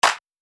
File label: Clap